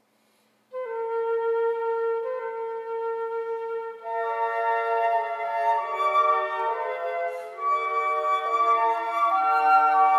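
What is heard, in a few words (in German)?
Flötenquartett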